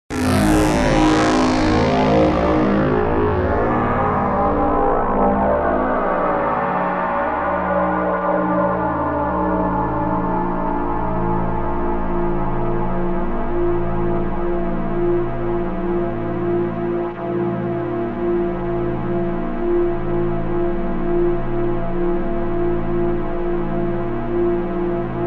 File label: science fiction energy shield force field buzzing electric glowing